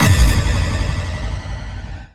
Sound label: Kick Phase